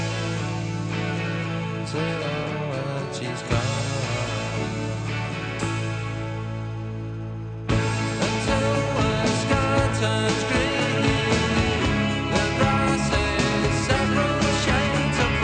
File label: rock